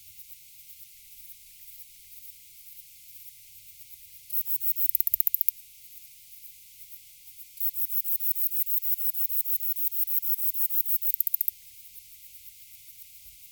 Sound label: Platycleis affinis field recording 20°C